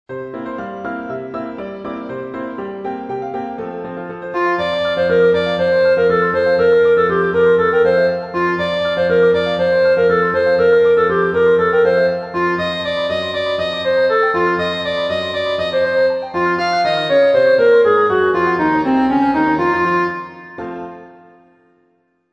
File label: Soprano; gondoliers; Now Pray What Is The Cause